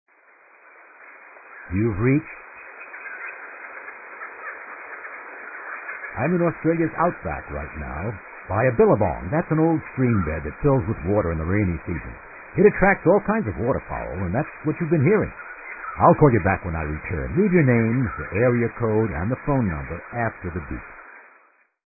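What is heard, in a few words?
Australia's Outback, Answering Machine Messages; Austrailia's Outback; Austrilian Outback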